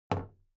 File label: big plastic case handle bounce soft; objekte; kiste